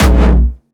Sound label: B1, Jumpstyle Kick; Vengeance Samples; VEC3 Bassdrums; VEC3 Jumpstyle Multis; Jumpstyle Kick 8